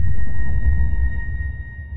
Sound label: sonar Tail Suit Close; Barotrauma; Real Sonar